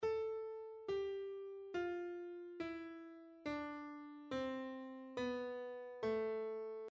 Am 旋律短音階 下行形

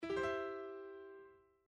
アルペジオ

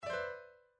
グリス 上から下

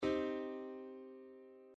Cm コード

